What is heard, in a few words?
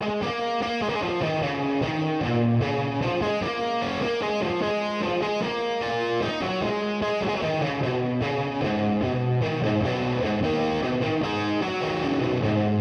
verse guitar